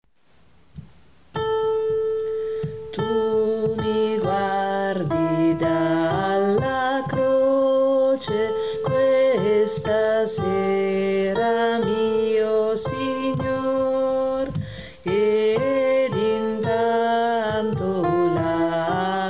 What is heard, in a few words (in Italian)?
tu mi guardi dalla croce tenori